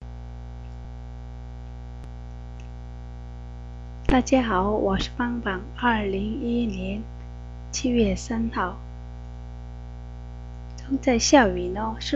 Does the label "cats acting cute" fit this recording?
Yes